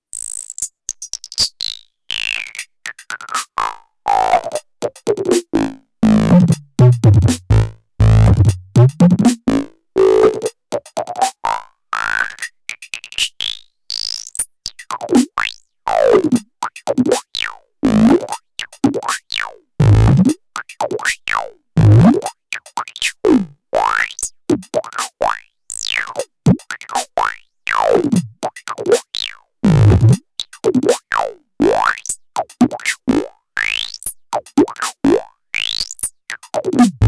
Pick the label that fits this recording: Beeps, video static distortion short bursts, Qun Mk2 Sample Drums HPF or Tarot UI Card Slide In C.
Qun Mk2 Sample Drums HPF